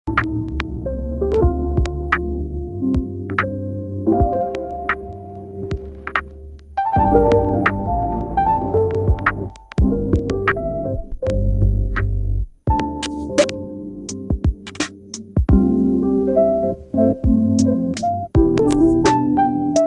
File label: Lofi Music